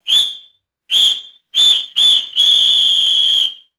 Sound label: short double blows on a metal whistle